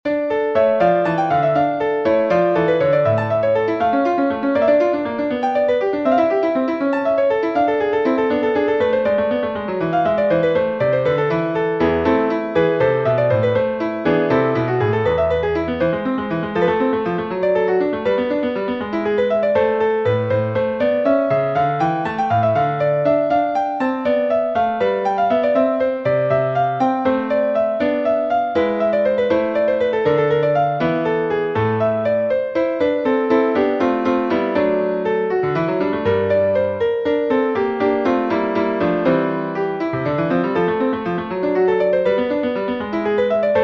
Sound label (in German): Partimento in d-Moll; F. Durante; erweiterte Fassung